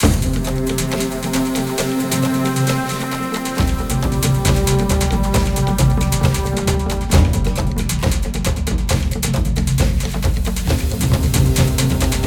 Radio Music; let it die

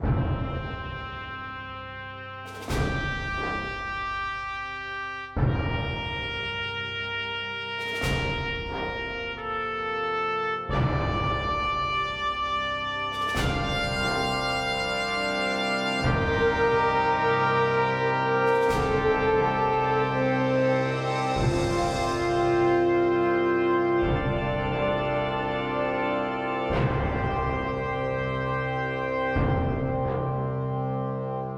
Cinematic Music - Space; LittleBigPlanet Karting; PS3

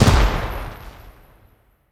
Result Fireworks; Pac-Man Championship Edition 2 Plus

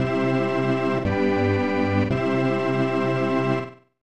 Piano; Kingyo Chuihou Tobidase Game Gakuen; SNES

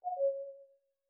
Knock Notification; Do Not Feed the Monkeys

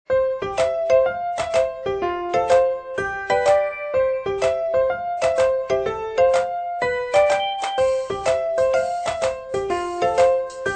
instrumental

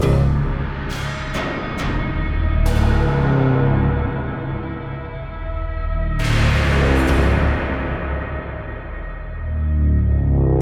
Drill Rig Conveyor Belts; Skylanders Giants

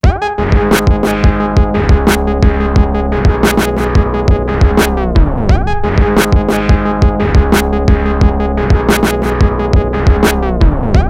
Instrumental; pumped bmx windows gamerip 2015

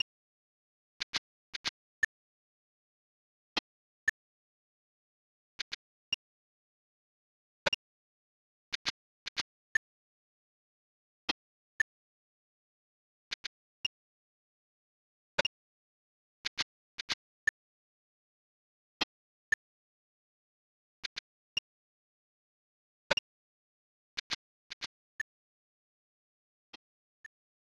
Drawbridge Puzzle; Mickey's Ultimate Challenge; SNES

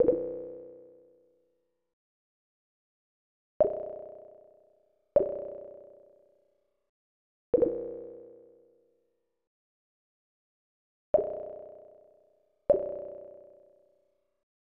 Dripping Water; marvelous; snes

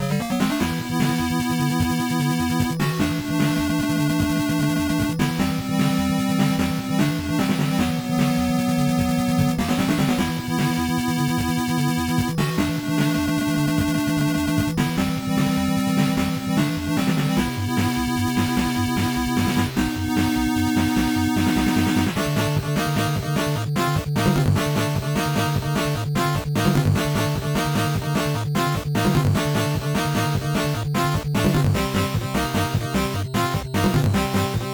Stage BGM; Mono~Stereo

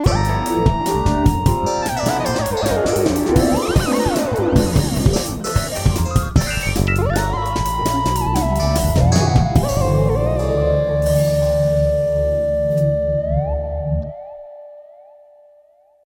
Funk Exploration

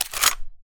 gun shotgun pickup; SFX; Guns Weapons

